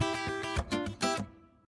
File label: 3D Perc Unlock; Pantech; Discover P9090